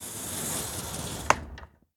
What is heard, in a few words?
Door Slide Open Glass; SFX; The Inn